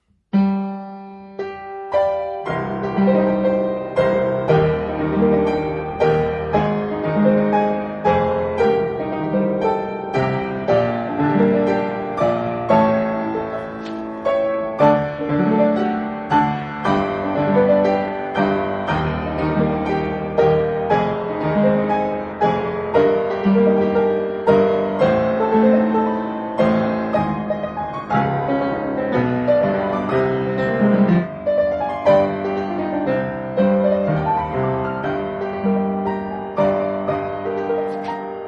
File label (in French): Stabat mater piano seul; Grandval n4